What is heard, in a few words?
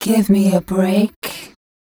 f mel d, fem Vocals